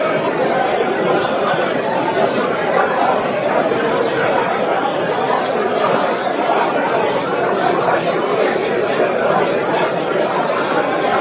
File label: crowd